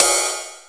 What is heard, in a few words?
RIDE; DMX Ride2A 1 of 4; Oberheim drum sound chips